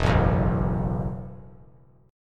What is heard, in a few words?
E Suspended 2nd Suspended 4th E, down